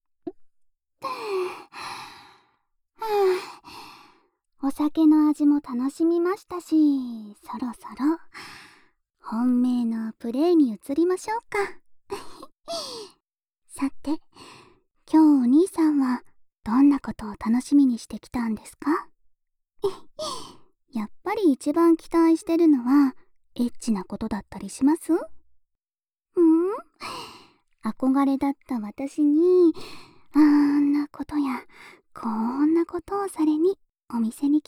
お耳、くすぐってあげますねー♪（右耳ふーふー）; お兄さんのお耳、くすぐってあげますねー♪

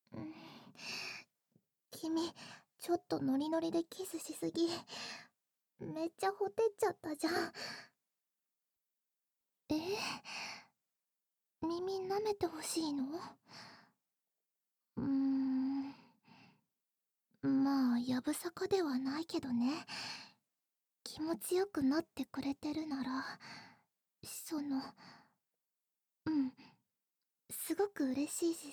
耳舐め, ASMR; 左右反転ver

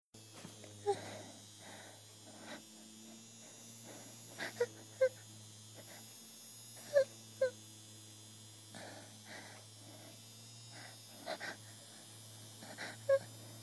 23歳 喘ぎ声

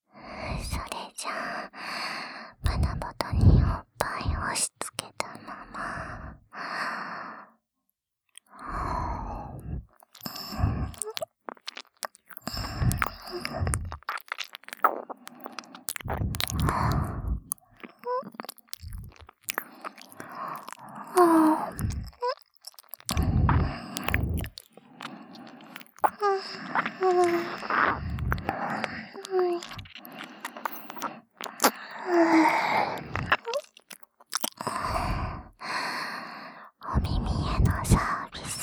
耳舐め, ASMR

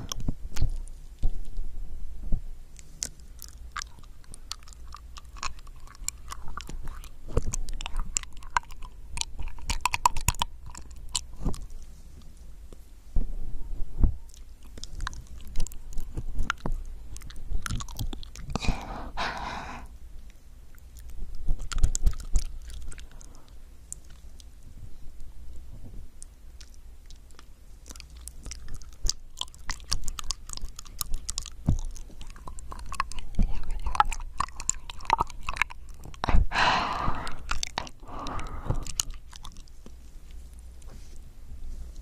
右耳 耳舐め

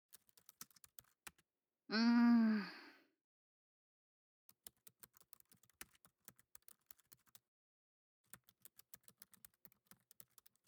カタカタ ループ仕様

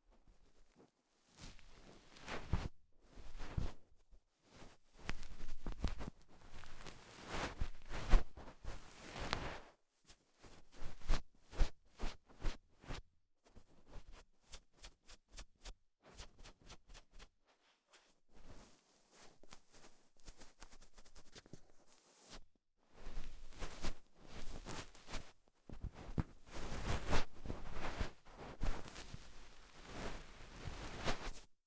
梵天; 3DIO; 耳かきに関する音

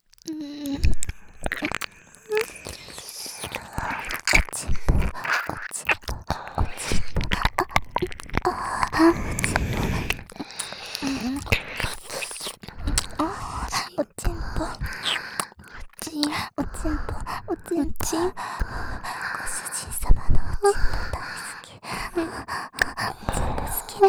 耳舐め喘ぎ; お屋敷メイドのハーレム淫語ヘヴン 気が済むまで耳舐め淫語を堪能できるバイノーラルオナサポ音声

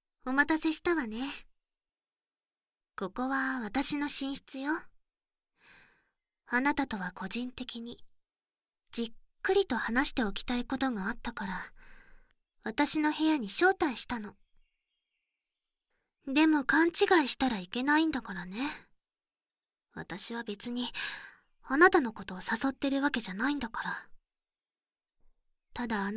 耳かき; あなたのお耳、癒します 〜クールで甘々な巫女さんに癒されませんか〜; つくしの耳かき

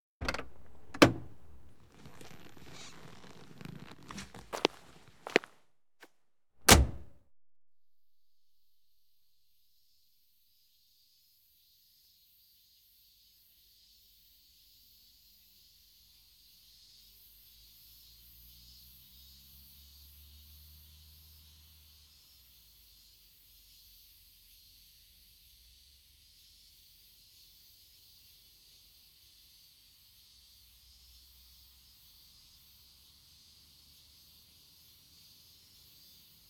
80メートルの大噴水(ダム湖の音); かにこ ASMR 05

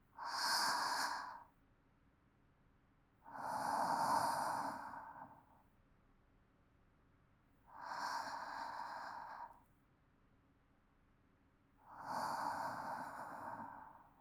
穏やかな寝息ループトラック; アルジェ; 「アルジェとうたたね」

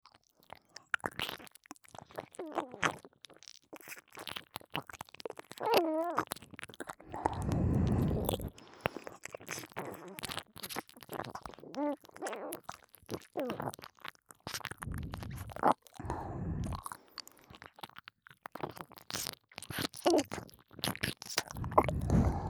耳舐め

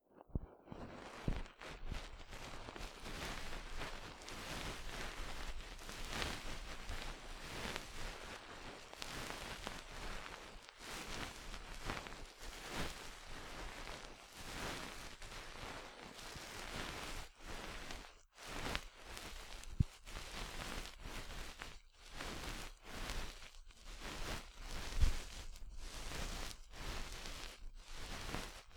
梵天, 声なし耳かき

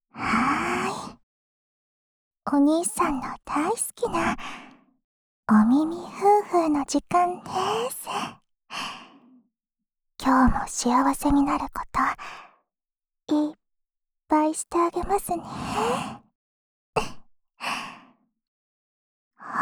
安眠用お耳ふーふーループ（右耳, ASMR

